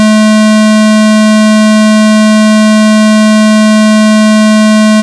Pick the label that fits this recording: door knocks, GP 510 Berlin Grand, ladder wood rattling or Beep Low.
Beep Low